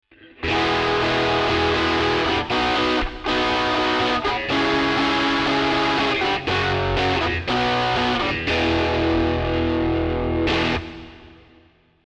RAT

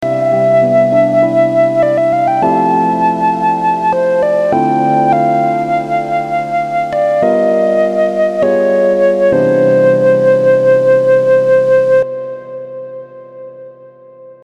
S Flute

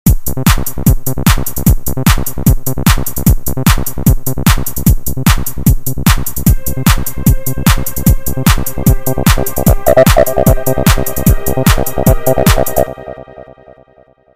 HD 303

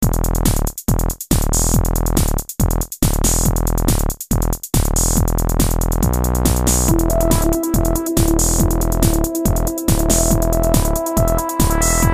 tb303

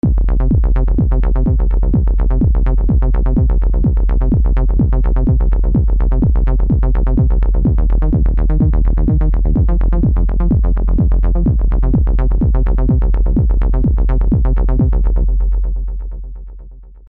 sbass

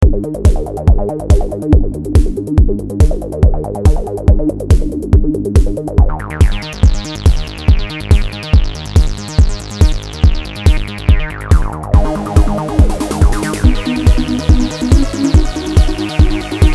arg syn

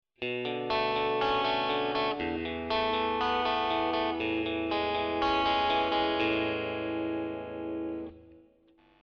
RAT

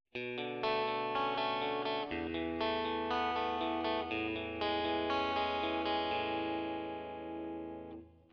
RAT

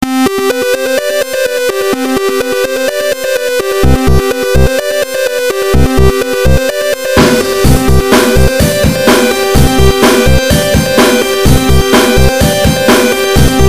fast pwm

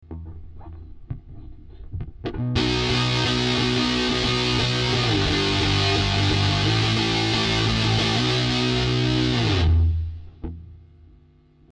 muff

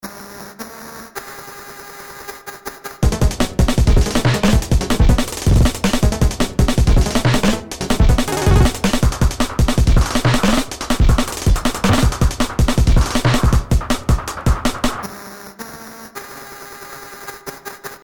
vibra

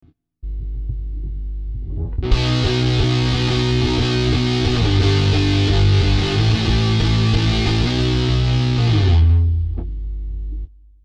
muff

